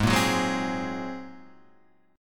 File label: Bb 7th Suspended 2nd Sharp 5th Ab